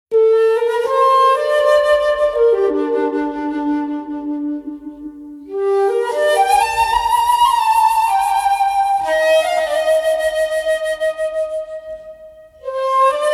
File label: flute forest